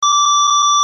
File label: Beep; Museum Alarm Options